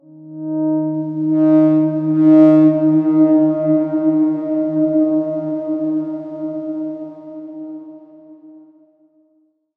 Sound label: Darkswarm D#3 f; healing soundscapes; Ableton